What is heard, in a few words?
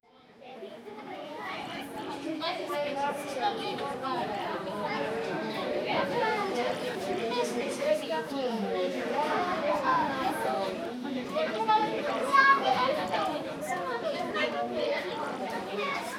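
children audience in theatre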